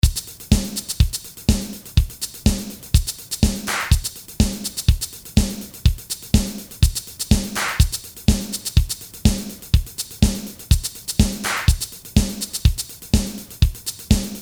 Dance femur; Akai MPC1000; Flash memory demos

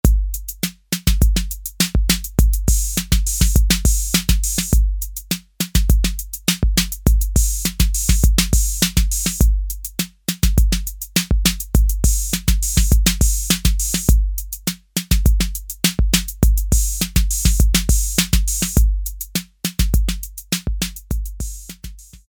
ROCK, Roland TR-808; Factory

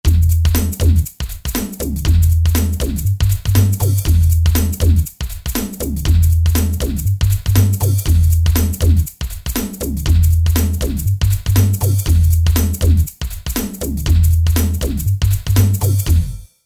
Rap Hip Hop

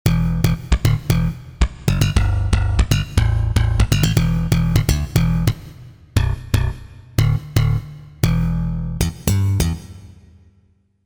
Slap Bass, Roland JV-880 Factory Patches

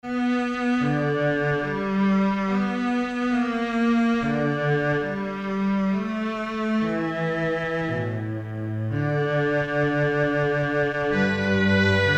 CELLO, Strings, Korg DSS-1; Strings II

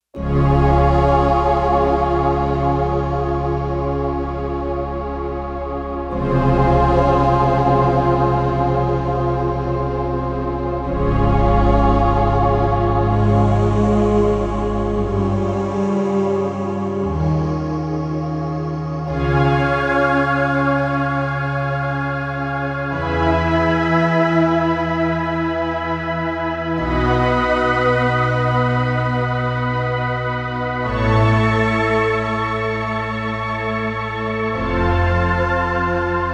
Future Choir; Digital Synthesizer

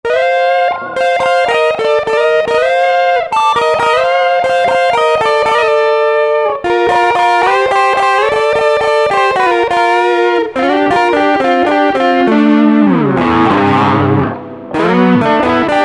HARMONY SOLO

